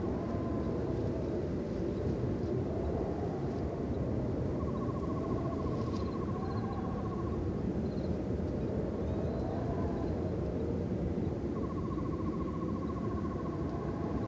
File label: podrace ambience; Mos Espa Podrace; Lego Star Wars (PC)